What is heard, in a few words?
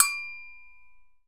Agogo Hi Long; EMU PX-7